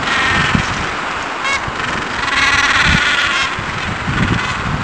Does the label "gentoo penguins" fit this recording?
Yes